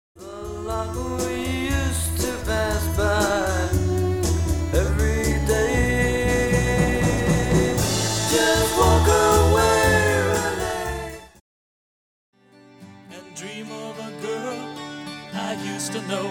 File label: drum hits